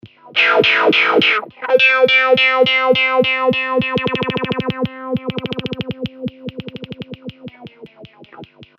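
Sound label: Spin cycle, Line 6 M13 guitar processor; В сэмплах кручу параметры в режиме "он-лайн"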